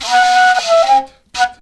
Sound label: FLUTE GRV; Best Service; World Colours